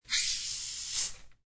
Soda Water, Twist Open Cap, Carbonated Drink Cap Fizzes; Soda Gun Sound Effects